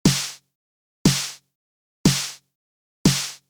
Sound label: snare; 军鼓（干声）; Waves; 压缩听不出来？初学者的压缩听力训练来啦